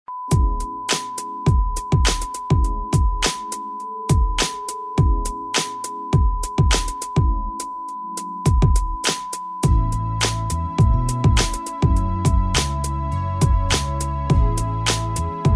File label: smooth melody